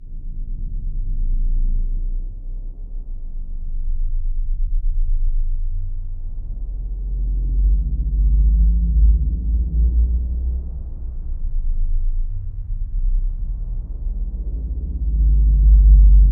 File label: Scary, nightmares; Dream Zone